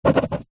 office pen ballpoint paper hollow table sign signature autograph; zapsplat